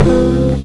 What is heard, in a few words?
WHAMP CHO, Hip Hop Kit; E-MU Formula 4000 Series Vol. 1; Hip Hop Nation; Hip Hop Kit 2